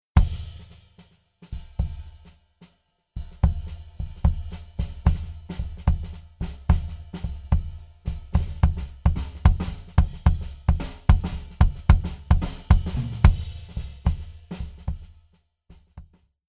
Drums Kick Exp On

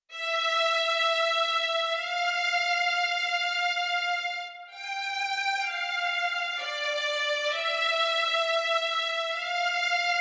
Strings

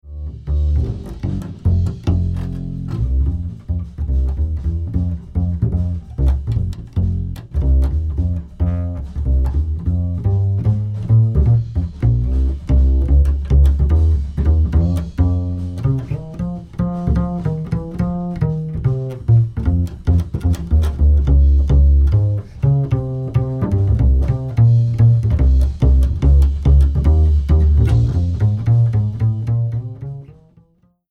Bass Mic

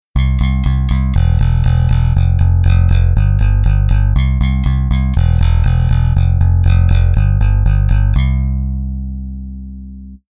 Bass Effector Pre

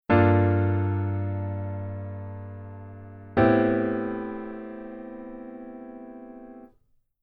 Jazz Waltz Chord Chorus

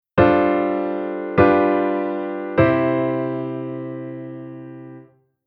Chord IIm7onV-V7-I

